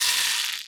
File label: box rattle; Kenya Box Rattle